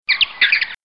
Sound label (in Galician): piar